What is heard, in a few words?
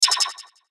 Rollover Whoosh Warble; Mouseovers; Multimedia; Blastwave